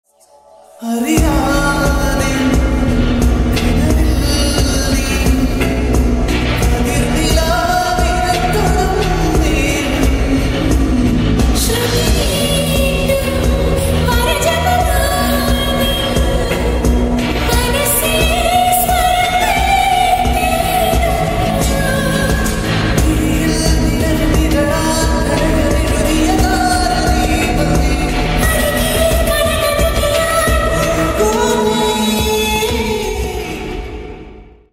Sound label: malayalam ringtone